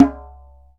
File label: Djembe De; Planet Earth; Digital Sound Factory Planet Earth